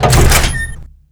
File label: door bunker open; Doors; Call of Duty Modern Warfare